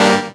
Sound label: Synth Shot; Vengeance Samples